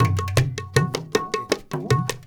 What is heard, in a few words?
INDIA R, Heart of Asia, IND ENS